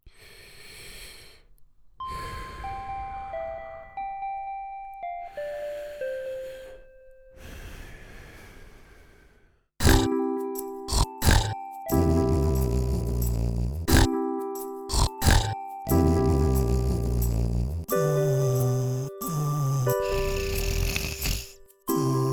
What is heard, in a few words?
SNORE CHESTRA SILENT NIGHT